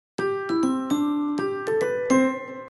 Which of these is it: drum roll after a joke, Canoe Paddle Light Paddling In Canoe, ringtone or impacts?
ringtone